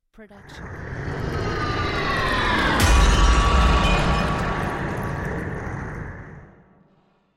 designer magical hit; SoundsCrate

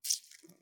blood squish

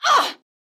woman injured grunt

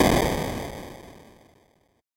8 bit explosion death

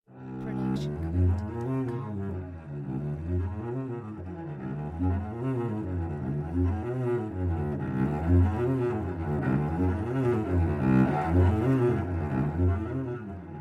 Cello Whole Tone Runs; SoundsCrate

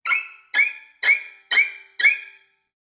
Violin Psycho Screeches

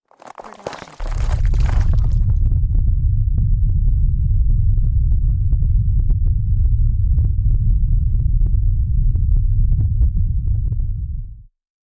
earthquake initial crack rumble; SoundsCrate

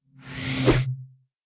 woosh

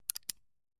Cowboy Revolver Cocking; SoundsCrate

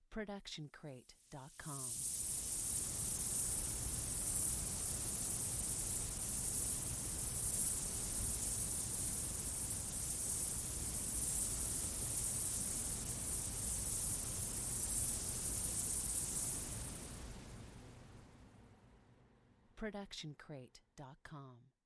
Magic Portal; SoundsCrate

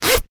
zipper short

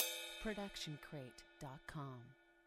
ride cymbal 20 inch bell; soundscrate

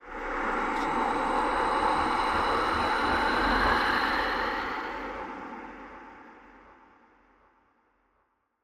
spooky wind; soundscrate

